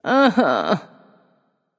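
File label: ugh, female; smite; voicepack; hera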